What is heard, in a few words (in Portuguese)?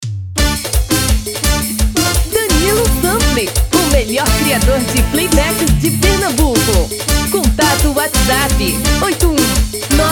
Caboclinho tom feminino